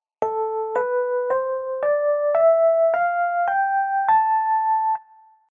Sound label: Am Scale